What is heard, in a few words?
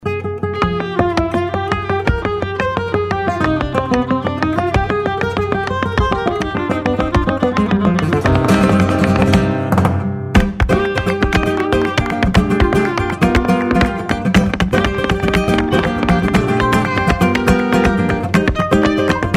farruca